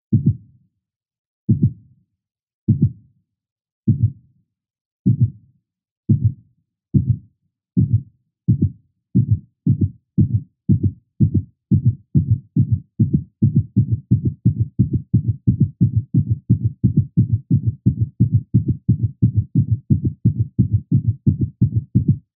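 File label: heartbeat speed up low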